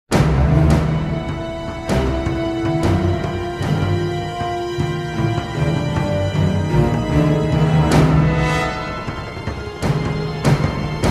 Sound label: night attack